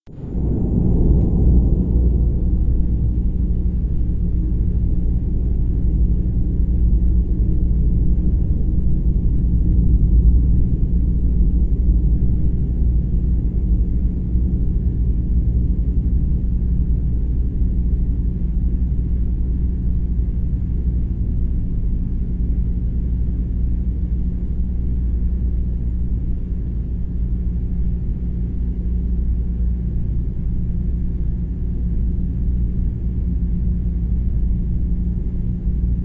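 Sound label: Ambient Basement Loop; silent hill homecoming 2008 gamerip pc ps3 x360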